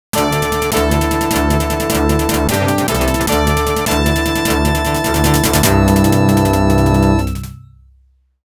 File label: Mission Clear; TAITO SOUND BULLETS (2025)